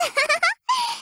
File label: jump; m4mod